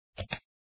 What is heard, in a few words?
sweets